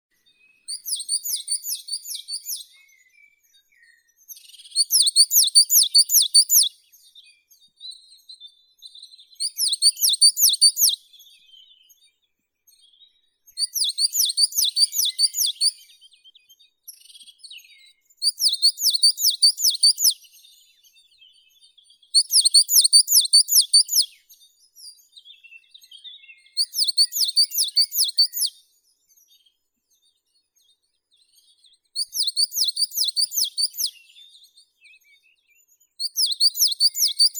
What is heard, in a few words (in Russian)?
moskovka periparus ater